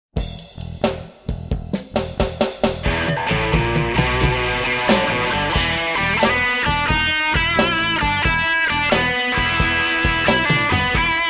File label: blues rock; kytary